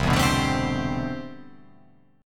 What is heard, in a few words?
C Major 13th C